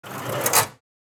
Cash register drawer close

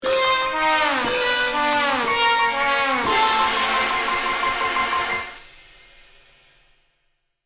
Comedy cartoon wah wah wah fail trumpets

